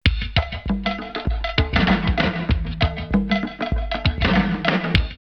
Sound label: grimm groove 98, drum loops, old school; X-Static Goldmine